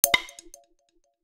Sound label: Wood MMC insert; Nokia; Audio themes